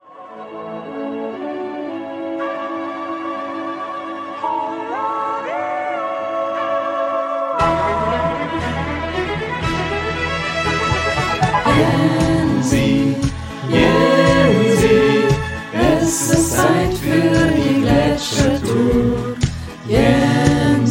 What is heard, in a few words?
jingle